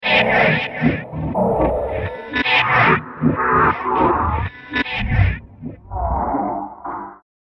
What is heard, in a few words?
Space Car Wash, science fiction sound effect